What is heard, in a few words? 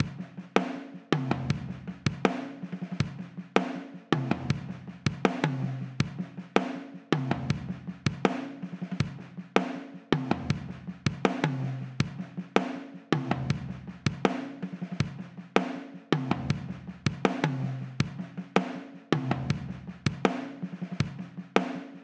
Filter Drums 160bpm